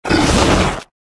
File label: Lizard Block